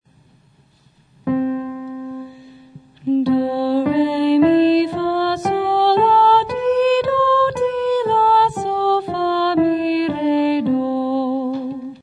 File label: major scale